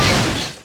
crush, Impact

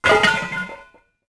manhole clank, impacts explos

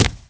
land, FOOT; The Elder Scrolls III Morrowind GOTY; XBOX

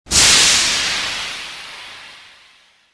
jump stream start

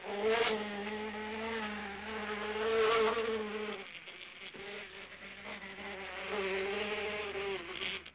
fly; Pirates Of The Caribbean

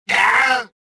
choke

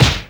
punch

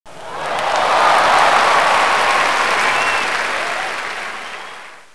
crowd

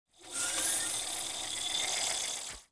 comm Screen deactivate; machines mechanical

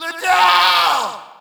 Shout, audience; UFC Tapout 2

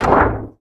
Foot Duct, Footsteps

